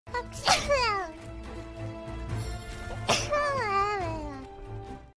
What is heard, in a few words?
sneeze